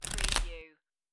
Playing Cards Shuffling Cards Flipping Onto Table Short